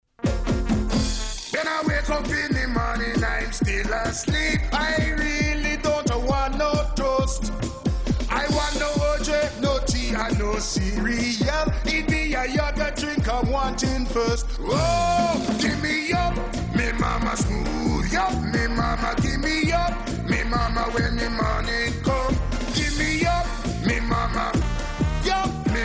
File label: commercial song